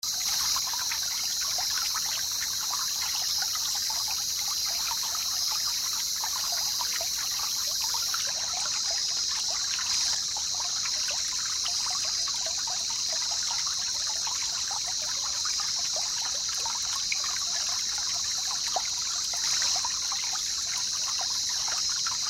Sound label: jungle river and insects